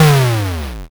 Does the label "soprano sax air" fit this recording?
No